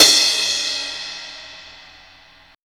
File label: Ride set, Drum and Bass